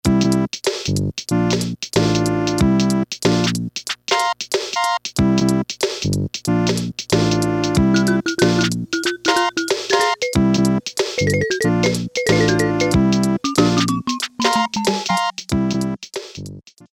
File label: HIP-HOP 1, Casio Rapman rap patterns